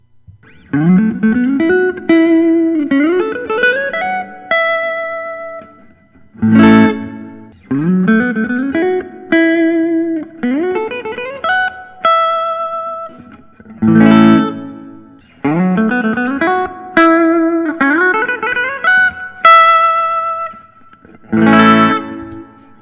paul reed smith mccarty 594 artist orang

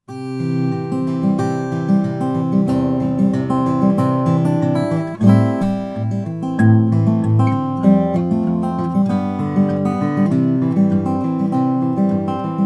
kiwi african blackwood; laurie williams

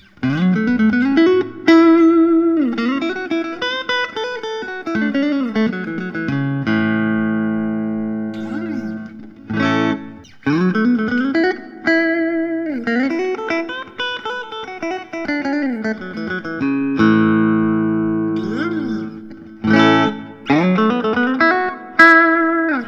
fender custom shop 61 telecaster custom

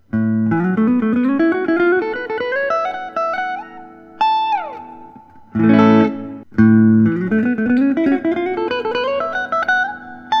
parker fly mojo flame transparent

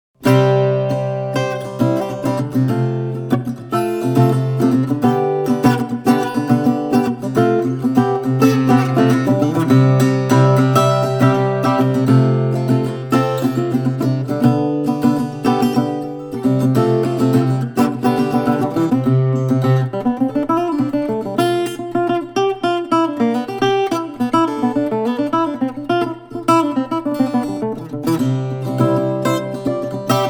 gibson tg 0 natural

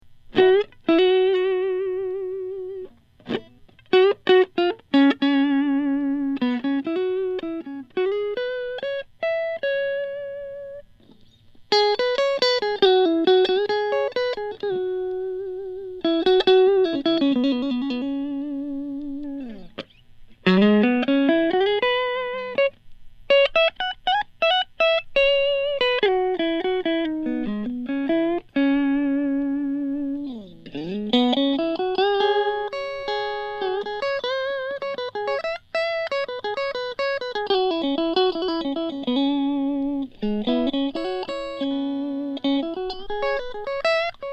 d pergo vintage fft; moss green

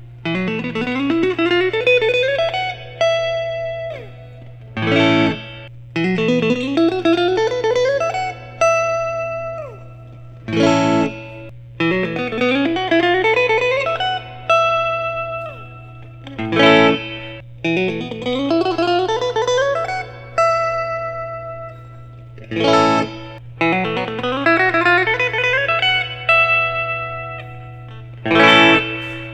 retro classic s olympic white; grosh

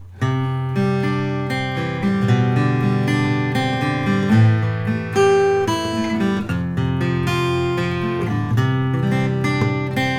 collings 002h with adirondack natural

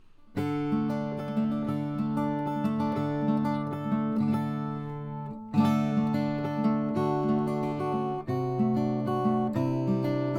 franklin om mahogany

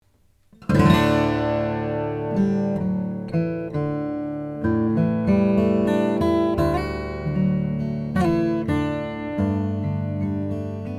franklin guitar jumbo birdseye maple

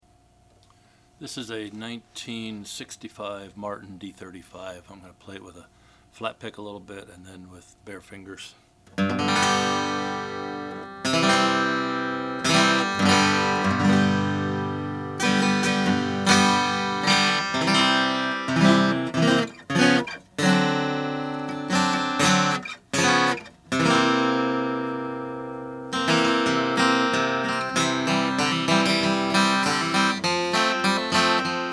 martin d 35 natural

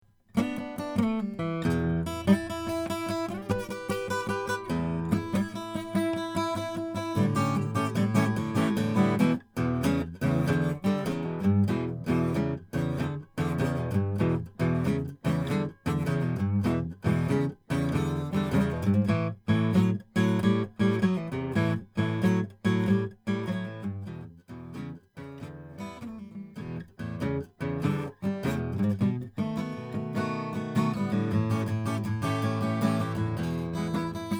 guild f50 maple; 1975

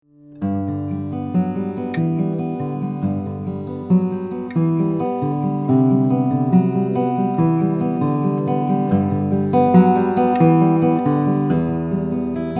forster aged indian rosewood

